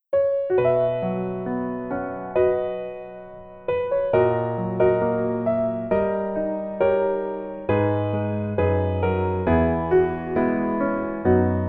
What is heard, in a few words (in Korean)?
Piano ver 멜로디 MR -1 키